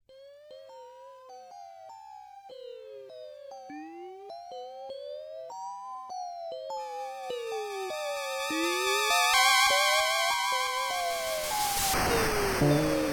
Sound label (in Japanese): ラストシーン FM音源版; fray msx remastered soundtracks 2008